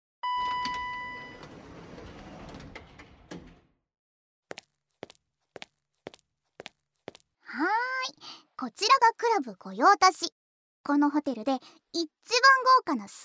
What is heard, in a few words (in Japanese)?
フェラチオ; お口の中に、びゅー♪ びゅー♪